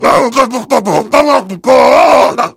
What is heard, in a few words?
heavy laugh long